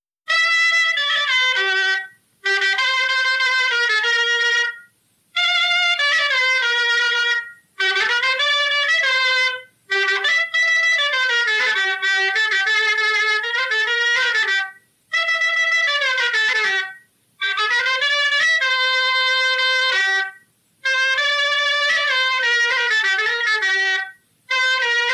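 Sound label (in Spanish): Danza, Bailes tradicionales en Valladolid; Mucientes; Danza con el ritmo anterior de Mucientes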